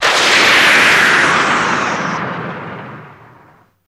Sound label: Bubbles Breaking